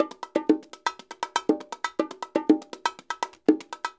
percussion loops 120; Sampleheads; NYC Percussionworks; 90 s Sample CDs

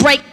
RAVE

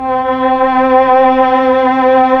STR, Melo. Violins, Tron Violins

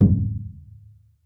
TOLOWA, American Natives, Earth Tones; E-MU Formula 4000 Series Vol. 4

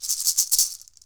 PUILI, HAWAII PERC

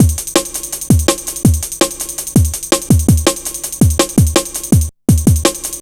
165, Drum Loops, Drum & Bass; Total Drum&Bass

